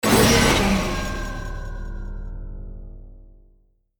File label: spell casting fail